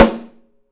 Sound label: Studio Snare, 3-D Audio Collection, 3D Percussives; E-MU Producer Series Vol. 5